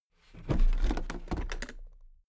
toolbox open regular

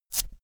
velcro open cap fast

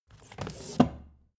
book shelve

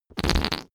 whoopee cushion fart low

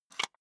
cassette case close soft

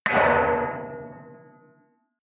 metal impact bell large

